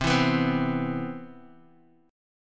C Major 7th, chord; CM7 Chord {X 3 2 4 1 X} strummed

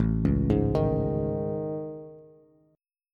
A Major Sharp 11th, Bass, Bass Standard; AM#11; arpeggiated; JGuitar

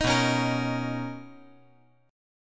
B Major 9th B, chord

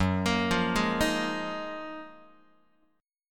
Gb Major 13th Gb down Guitar; GbM13 arpeggiated; JGuitar

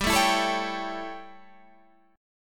A 7th G, chord

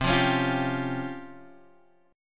C sharp Major 7th Flat 5th C

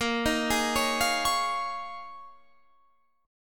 Eb 7th Suspended 2nd Suspended 4th Bb

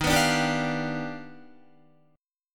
Eb Augmented Major 9th Eb down Guitar; Eb+M9 strummed; JGuitar

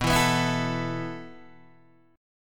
B 7th Suspended 4th B down Guitar DADGAD

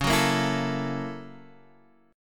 Gb 7th Sharp 9th Db, guitar

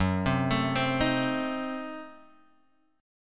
Gb-Diminished 7th-Gb-down-Guitar-Open F; arpeggiated; JGuitar

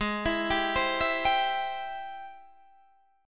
Ab Augmented Major 7th Ab Guitar DADGAD

